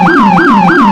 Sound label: yelp, sirens; federal sig rumbler